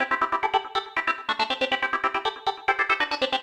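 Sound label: synth electro 140 A; Techno; Keys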